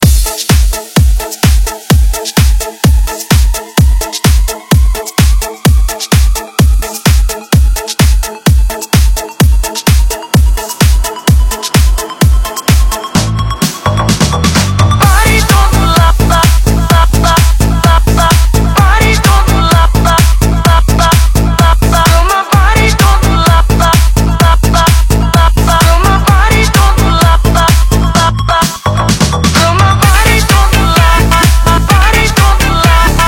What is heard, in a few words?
bpm128 Extended Mix